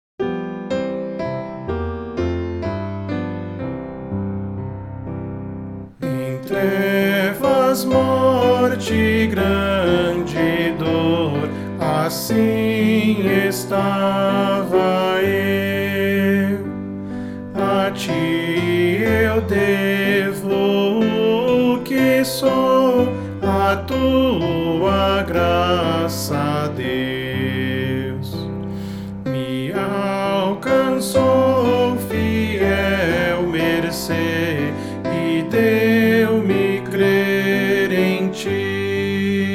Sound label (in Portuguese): voz pian